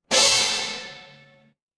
instrument gong

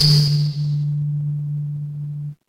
instrument kalimba

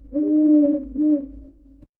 animal owl

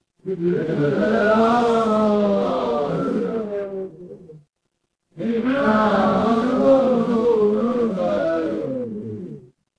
crowd gasp

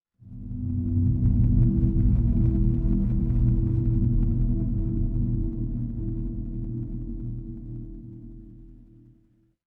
horror drone

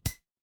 feedback confirm

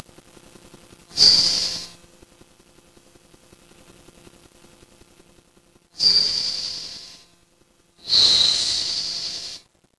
ambience forest

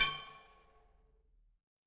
impact metal hit

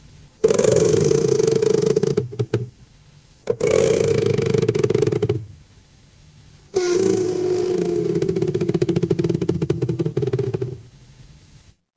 horror creak

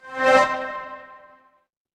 transition riser

